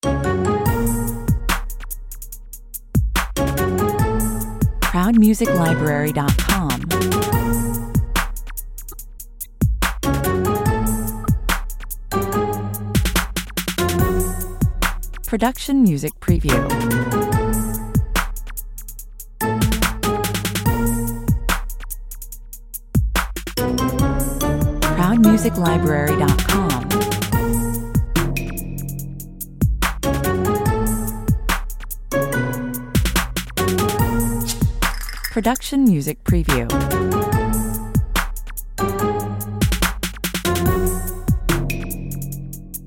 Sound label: cartoon